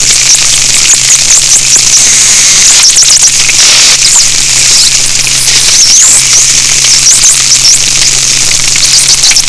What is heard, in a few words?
Tursiops Truncatus